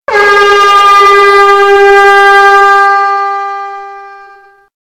horn reverb

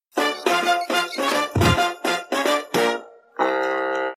funny fail music

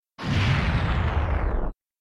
break

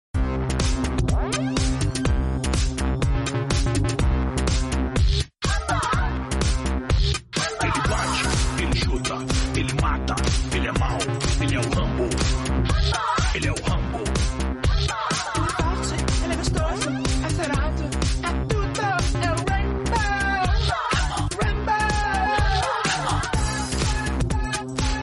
biniou

